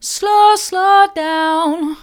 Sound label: slow slow down mid, vocal